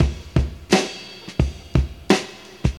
114 bpm breakbeat g sharp key, Free breakbeat - kick tuned to the G# note. Loudest frequency: 1053Hz; drum loops